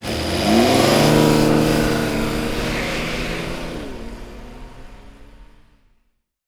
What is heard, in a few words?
Speedy Car Drive Away